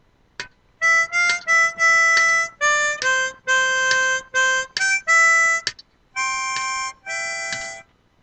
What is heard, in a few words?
Solo